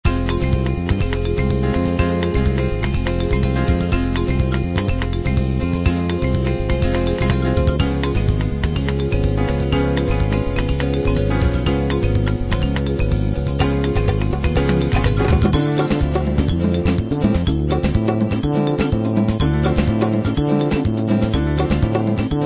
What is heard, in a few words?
latin jazz